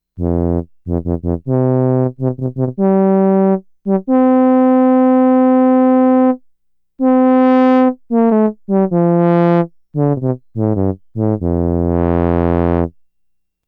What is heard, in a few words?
French Horn, Roland SH-2000